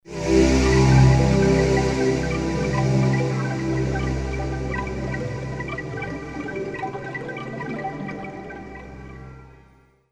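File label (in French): synthe texture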